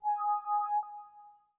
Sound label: Exclamation, Windows 7